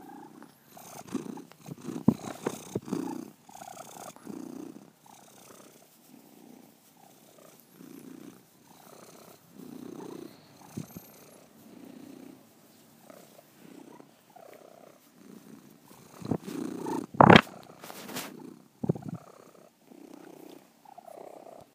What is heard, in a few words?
Rumbly Squeak Purr, Cats